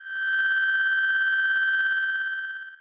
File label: Science Fiction Sci-Fi Electronic Pulsing Ringtone